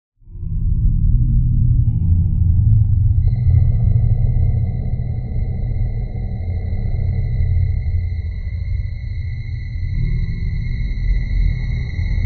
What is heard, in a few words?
zombie music